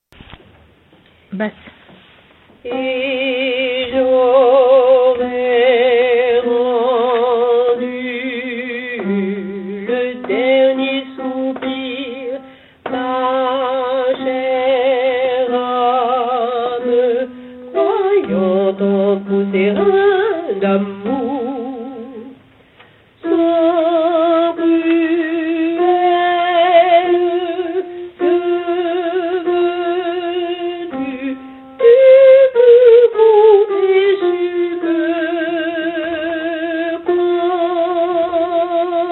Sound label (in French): Mon amant alti; O mon cher amant - " La Périchole" d'Offenbach